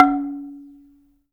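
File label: AFRICAN MARIMBA C#4; Heart of Africa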